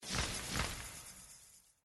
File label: Bat Wings; slots; Halloween Blast